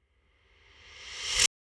Eerie Reverse Whoosh; SoundsCrate

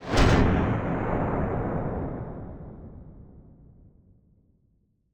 Lightning Crack; soundscrate

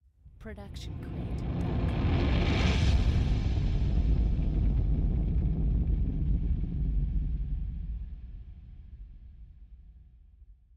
Industrial Rise; soundscrate

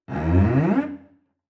Cello Slide Up; SoundsCrate

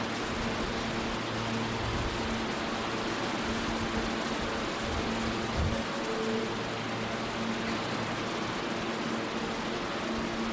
subway car exiting station; soundscrate

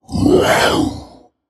monster growl; soundscrate

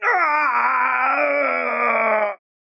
man injured groan long; soundscrate

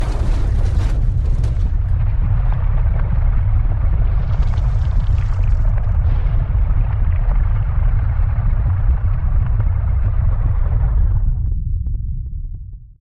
earthquake rumble various cracks snaps; SoundsCrate

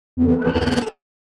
Low Robotic Charge up; SoundsCrate

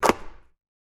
basketball swish

